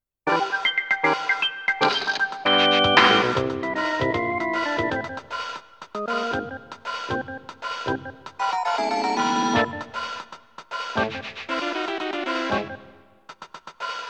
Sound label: Underground BGM -Radio Tone-; pulirula